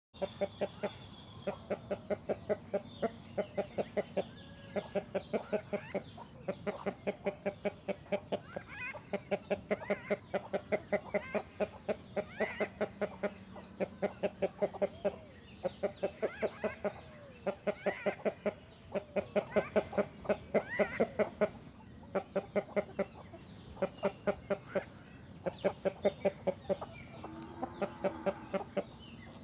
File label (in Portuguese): Galo cacarejando boi mugindo; aves